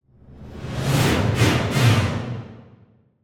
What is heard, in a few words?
Symphonic Hit Heavy Horror Final Chords - Triple; Hollywood Edge; sound designer's toolkit v2